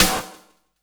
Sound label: SD, 170 BPM; Ueberschall; Drum & Bass Resonance